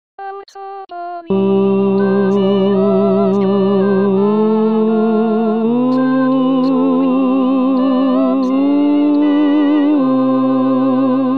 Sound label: aurtxo seaskan tenores